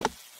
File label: button press; scientist